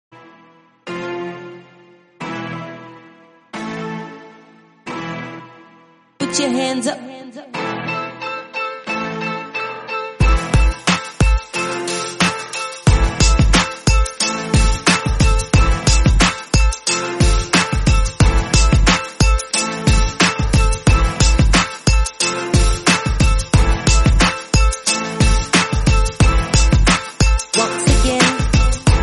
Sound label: FUNNY song